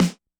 disco snare; Electro

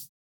no effect j city pop 1980 hihat

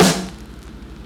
drumbreak snare; Hiphop

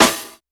Classic hiphop snare

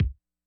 disco kick; Electro

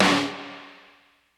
80s reverb snare

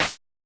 snare; Electro; alternative

